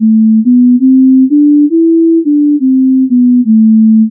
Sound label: reference phrase; practicelens